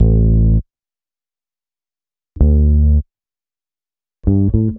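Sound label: BASS 1, 100 bpm, Funk; USB Soundscan vol.41; AKAI; Roots Funk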